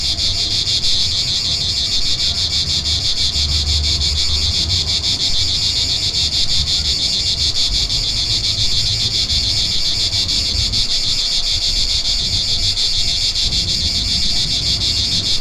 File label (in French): Enregistrement Cigales